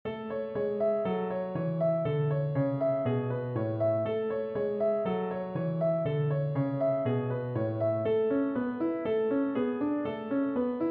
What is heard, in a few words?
Piano